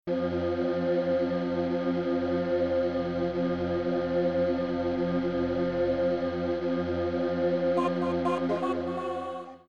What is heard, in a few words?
Pad; What Evil Lurks